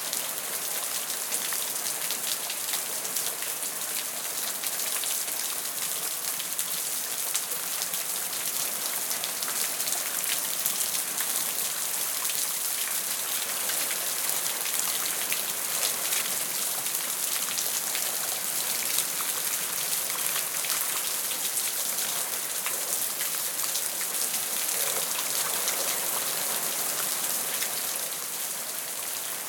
Ambience Rain Moderate; InspectorJ; Essentials 06 Rain

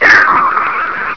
pain, fast zombie; hl2

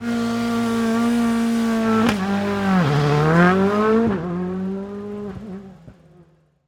Rally Car-Race Start; Rally Legend

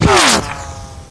near miss, weapons

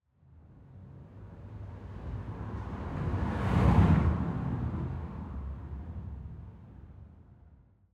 Designed car interior medium pass by; aXLsound

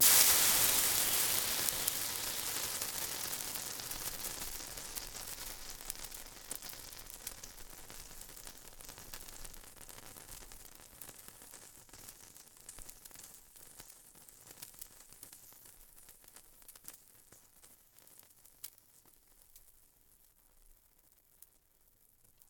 water dropped on electric stove; RedSonic; Frying Water

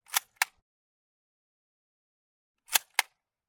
MP 40, Handling, Cocking, submachine gun; MKH416; Pole Position

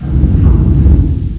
wall bit, ambient; hl2; kraken

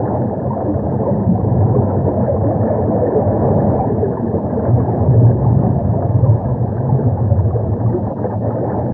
voice, ichthyosaur; hl2

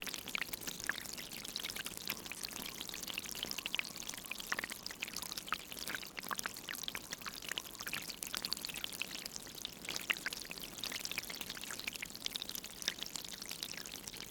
Water Flow, Submerge; InMotionAudio

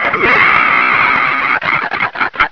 attack, zombie poison; hl2

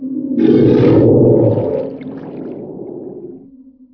water growl, ichthyosaur; hl2

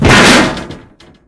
vent drop, metal; hl2; impact

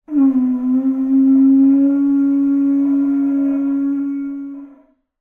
Seashell Pututo from Peru, Sounding Blow; Articulated Sounds; Seashell Resonance

